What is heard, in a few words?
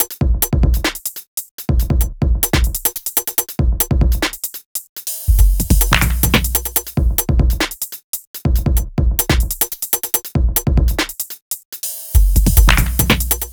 Urban Beat, Drums